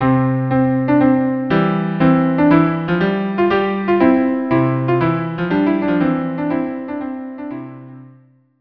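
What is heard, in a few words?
viola cello; La Majeste telemann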